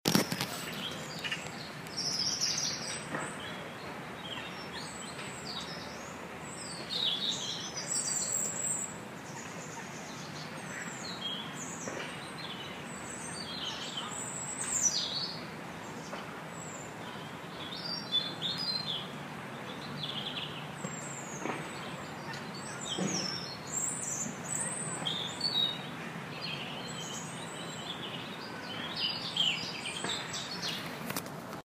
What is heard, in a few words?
kelvingrove park nature sounds